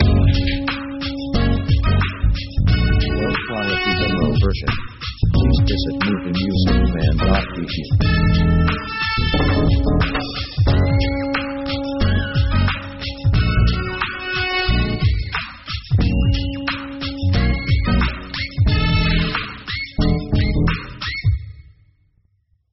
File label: hip hop fathop; power themes